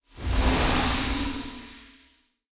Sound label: Pillar red main, monster